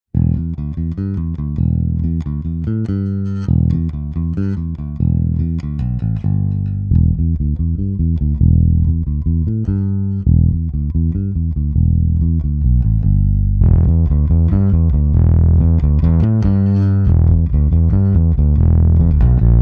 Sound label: DI neutral eq Dist